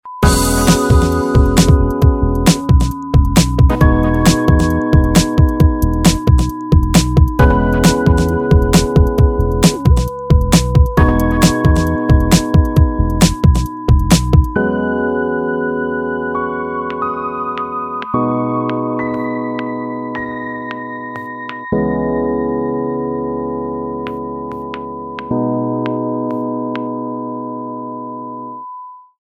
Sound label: air groove